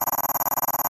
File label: science fiction scanner scanning tone